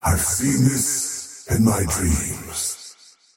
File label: death; dota; faceless void